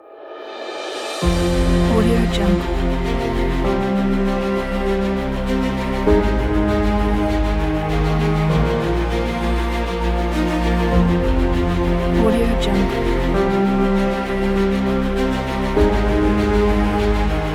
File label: epic; brightnotessounds